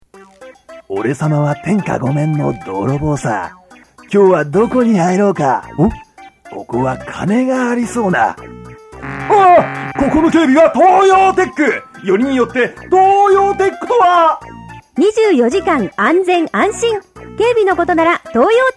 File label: comical